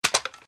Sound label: stick; stick panda